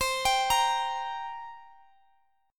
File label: G Minor Double Flat 5th, C